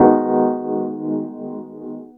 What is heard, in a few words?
pan; 90bpm; Demo Multitracks